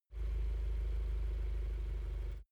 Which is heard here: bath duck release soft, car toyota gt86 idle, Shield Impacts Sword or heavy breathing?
car toyota gt86 idle